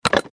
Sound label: Button Switch; Multimedia Web Interactive; Buttons (Sound Effects:Multimedia